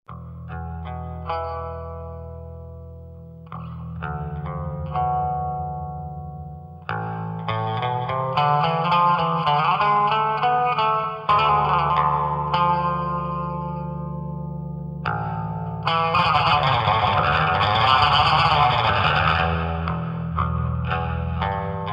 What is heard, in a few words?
bass solo